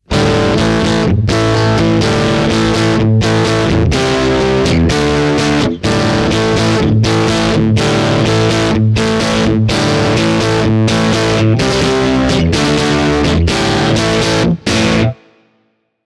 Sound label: bluetone load box line out distorted